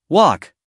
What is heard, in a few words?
walk